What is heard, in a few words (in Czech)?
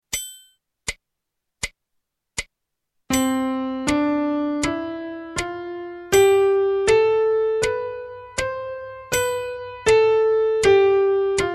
Stup. C dur